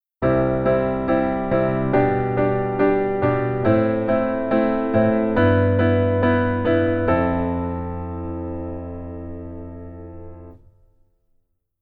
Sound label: Keyboard Rhythm Basic